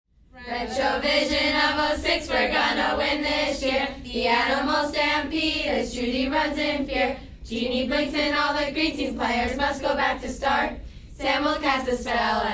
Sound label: cheers